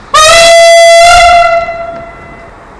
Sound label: horn